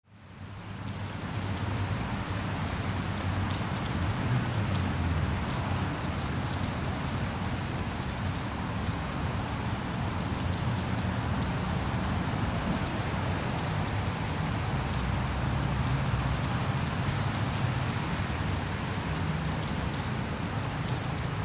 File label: Alley Rain Long, Urban light rain drips on cement and gutter. Distant city roar; overhead jet airplane at 01:30; Rain Sound Effects; Lynne Music